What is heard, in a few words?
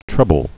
treble